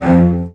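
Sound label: CELLOS G2, Synclavier Strings; ILIO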